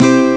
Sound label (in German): guitar dur; ST-XX Amiga Soundtracker Sample Packs